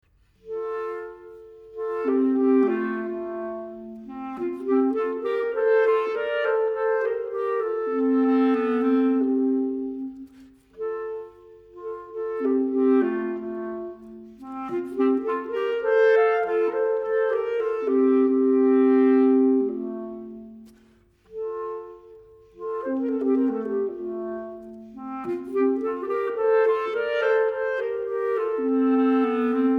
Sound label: clarinet classica, Larghetto; Duo KV 487